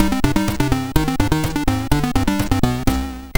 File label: sid MOS6581R2; Keystone Capers